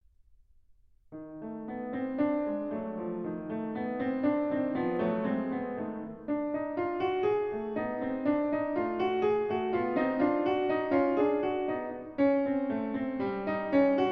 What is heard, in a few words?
Diminished Fifth, Piano; Mikrokosmos, Sz. 107; Bartók; Complete Solo Piano Works